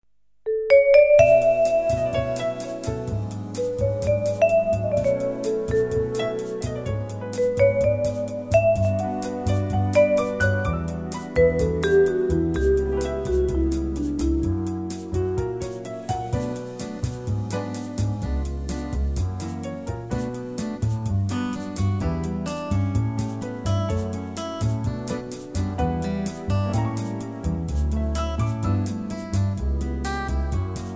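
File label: Bm bossa, Backing Tracks for Male Vocals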